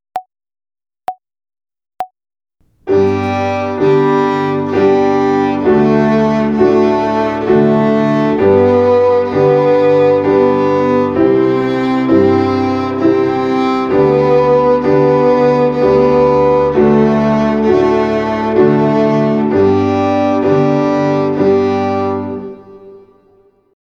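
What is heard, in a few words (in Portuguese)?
Ginástica até o dedo 3 - Corda SOL - Ternária, Violino; Violino para os Pequeninos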